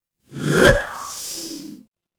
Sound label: transition whoosh